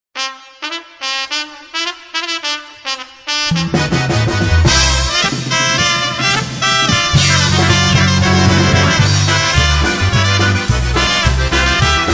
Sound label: squeeze box polka